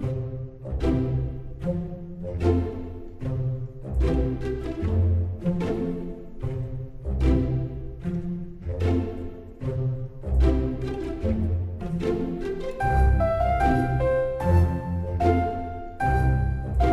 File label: Casual Theme Looped; Dual Blade: Battle of the Female Ninja